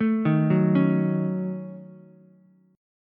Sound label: Gb Minor 6th, Ukulele, Gbm6 chord; arpeggiated; JGuitar